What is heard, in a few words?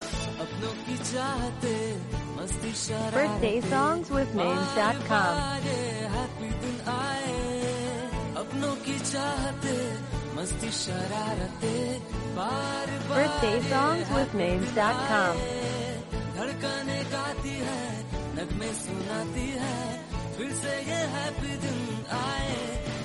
Happy Birthday Madam Birthday Song for madam; birthday songs for whatsapp status